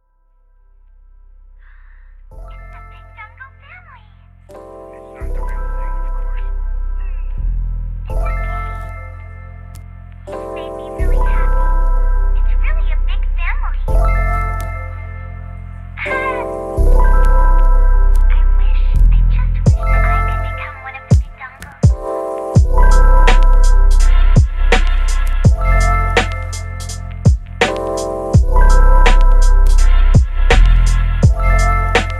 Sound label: floating flute